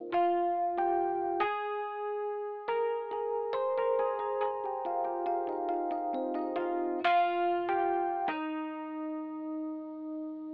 rhodes IN Oeksound Soothe2

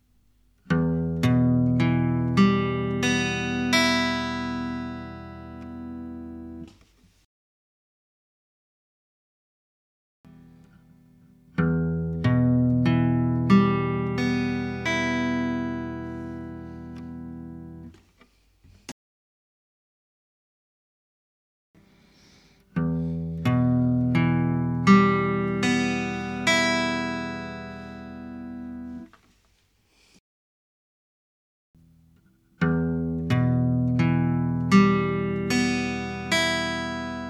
Guitar; Neve 543; SSL SiX 535

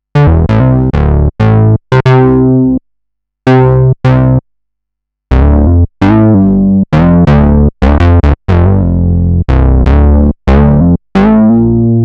Moog Bass

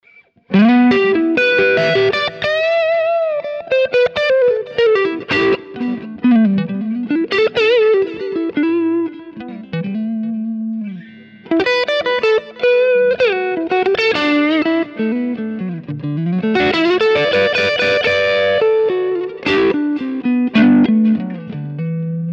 T System Crunch Neck; EMG

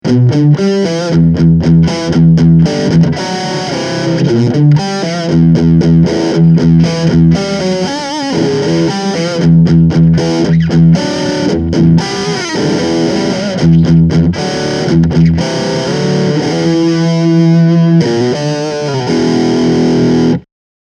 Boost

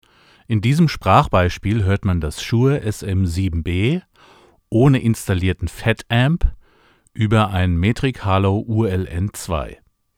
SM7B NO FetAmp; thomann fetamp

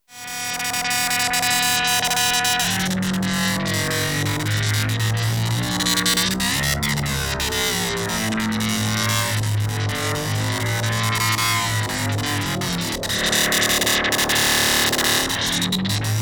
Feedback Lead

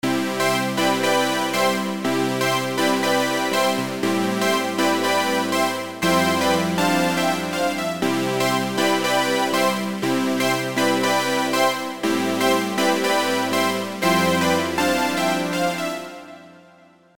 EZkeys 2 Synthwave; EKX Synthwave 3; Night City

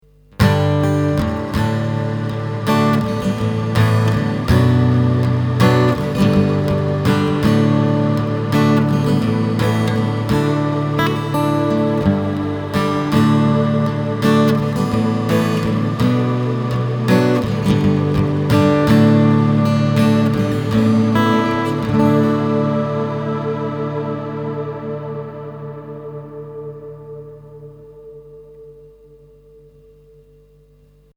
ZOOM AC 3 output Twenty One Pad

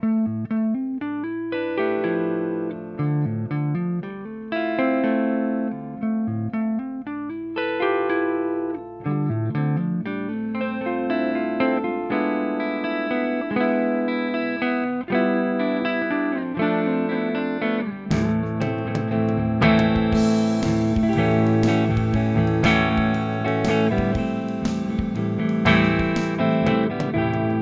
Pro Mod Clean Neck; Charvel Joe Duplantier

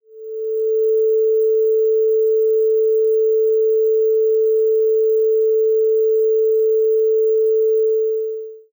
Intermodulation Sine 440Hz Noise 24k; Aliasing und Oversampling in der Musikproduktion; Saturn2

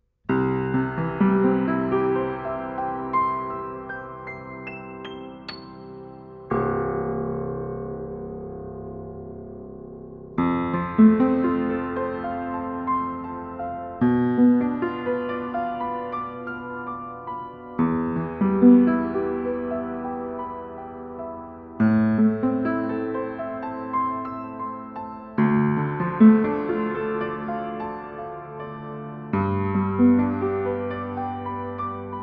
Piano Mic Outside Wall; DPA 4060

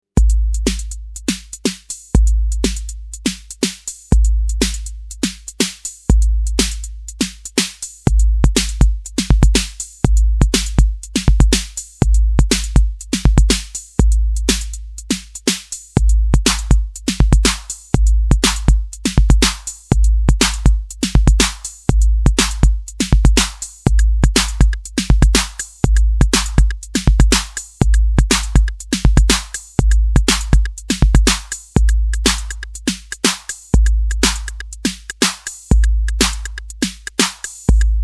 electro impro; drumatix

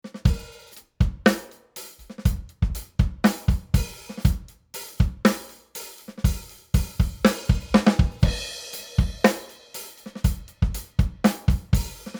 Drums BD

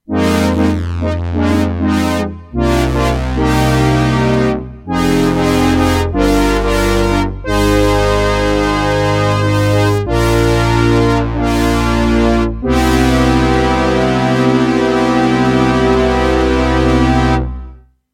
Horns

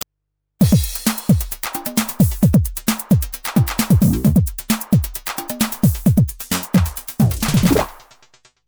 electribe

